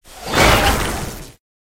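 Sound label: Worm buff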